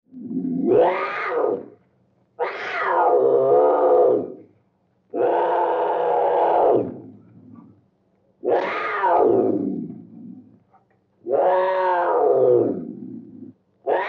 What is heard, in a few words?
Cougar Vicious Snarls